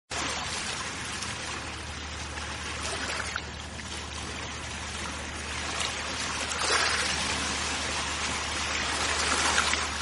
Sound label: Relaxing Sound Of Ocean Waves